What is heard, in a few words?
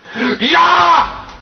attack claw; amnesia: the dark descent; madness archive; madness-ch03-demo; enemy; hater